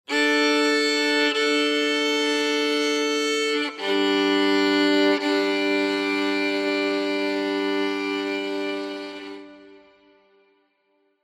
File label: Violin Documentary Drama